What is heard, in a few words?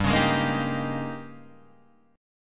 D sharp 11th G